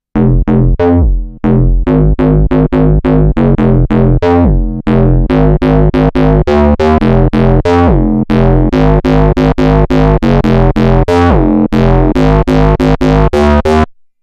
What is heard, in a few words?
Bass Drive; korg bent